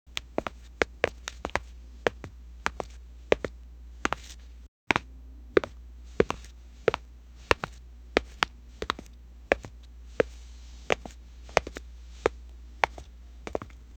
footsteps sound effect

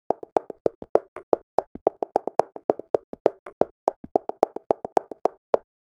clean isolated sound of, clip clop

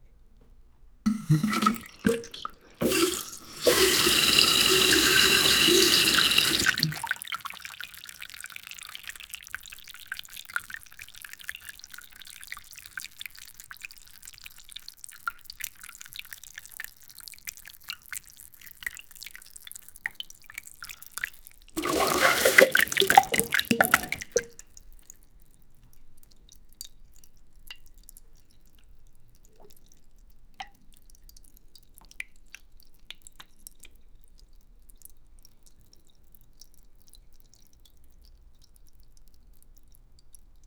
loud liquid diarrhea; natural

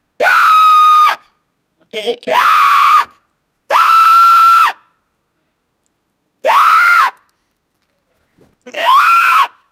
a man screams when a

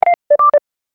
income received

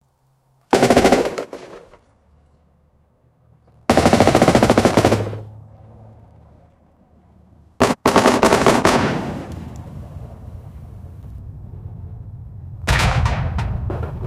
continuous fire from a, firearm

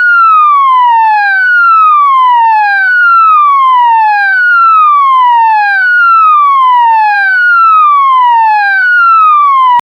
los angeles poli, alternating

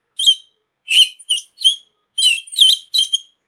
eating

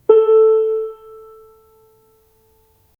warm and gentle, chimes

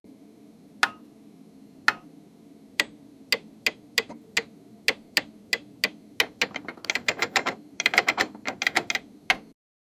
the sound of panels with, buttons

